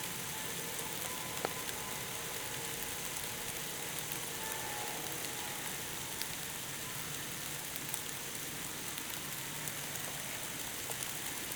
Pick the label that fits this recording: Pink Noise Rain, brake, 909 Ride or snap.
Pink Noise Rain